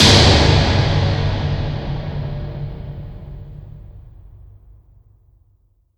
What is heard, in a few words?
SLAM HIT; Svarog